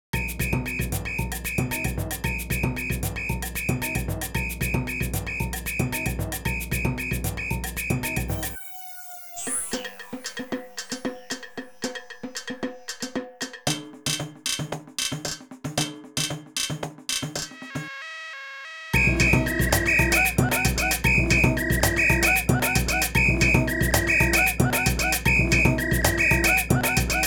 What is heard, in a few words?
samba groove